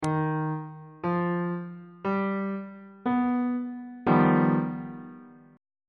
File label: g augmented 7th 2nd inversion on bass clef